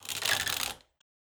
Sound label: rotate; shore